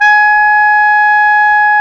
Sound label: WND CLAR2, Clar Jazz